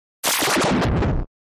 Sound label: Distort Warble Elong, distortion